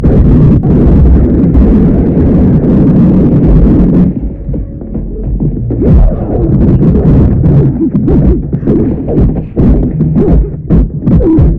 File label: NOISE; Caja de papel